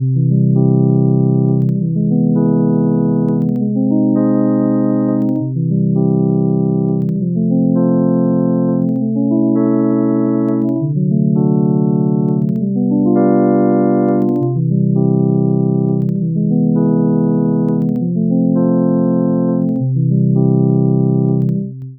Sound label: fixed c ji c drone progression